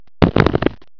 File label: zap, debris; svencoop; cracklife